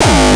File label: kick; shock. Ultimate Kicks vol. 2